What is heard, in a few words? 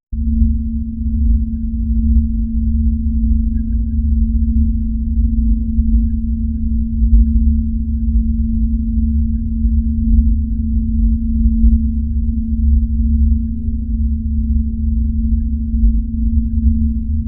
sound for an ocean enviro, submarine